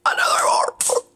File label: DRUM STAND HANGER